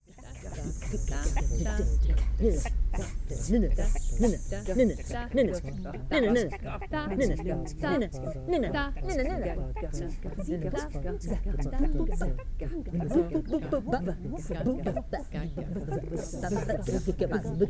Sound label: wild choir extract with birdsong